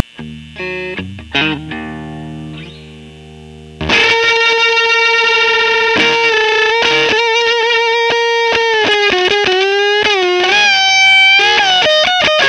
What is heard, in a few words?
fuzz, pedal